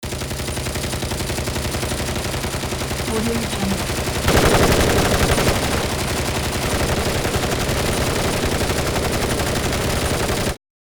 machine guns multiple rapid fire; prosoundfx

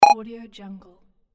game character skid; monochromeeffect

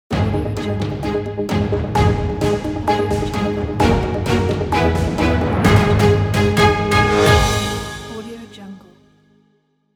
breaking news logo; musicalsmile

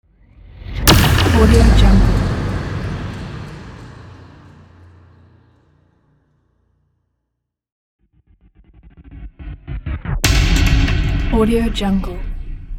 tense sound effect pack

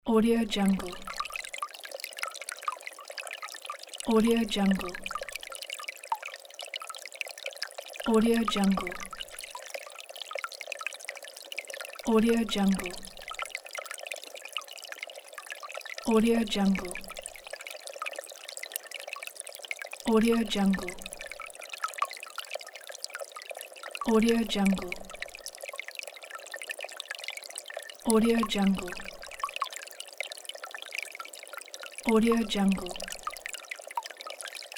flowing water

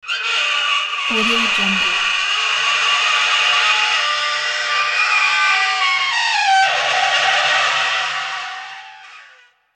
alien dry ice scream

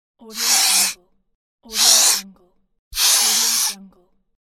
human sniffing

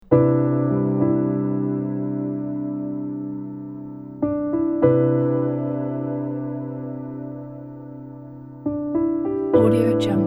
inspiring healing; viminodmusic

